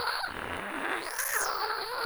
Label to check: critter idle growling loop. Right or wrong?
Right